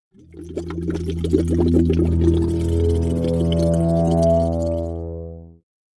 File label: Scifi Audio Logo Ident, Cauldron, Boiling, Synth Swell; Church Sound Effects